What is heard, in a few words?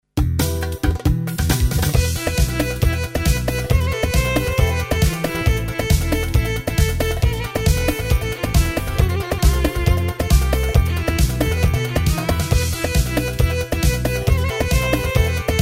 KEMAN; usb turk demo